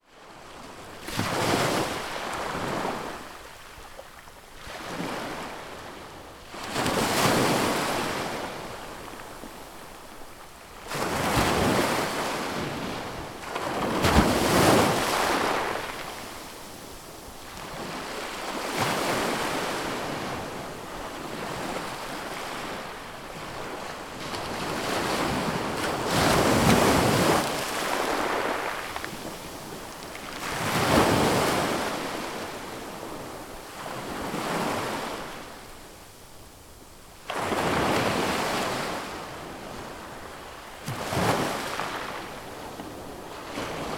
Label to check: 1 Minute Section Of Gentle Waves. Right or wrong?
Right